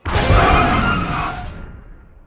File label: large Win; netent; multipliermayhem